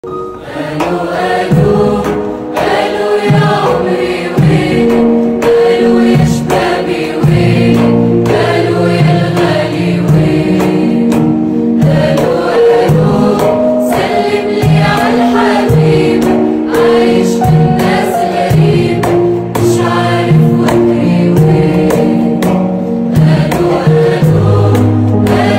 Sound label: music arabic sedaye zan